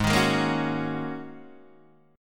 Ab 9th Suspended 4th Ab down Guitar Open D

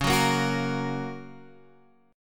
Gb Major Db

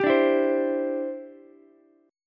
D 7th Suspended 2nd Suspended 4th D, Ukulele; D7sus2sus4 strummed

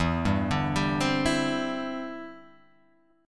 E Minor 7th Sharp 5th, down, Guitar, Open G

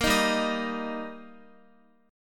G Minor Sharp 5th G Guitar, chord; Gm#5 strummed

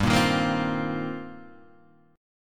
C Minor 11th G

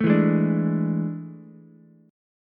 A Major 7th Suspended 4th, Ukulele; AM7sus4; strummed; JGuitar

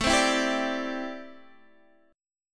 A Minor 11th A Guitar Open D

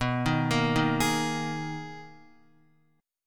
B Major 13th B down Guitar; BM13; arpeggiated; JGuitar

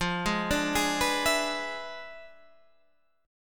E 7th F, guitar; arpeggiated; chord; JGuitar

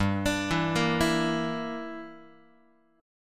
G Minor 6th, Guitar; Gm6 arpeggiated; JGuitar

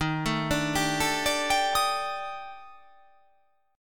D sharp Major 7th Flat 5th D sharp, chord

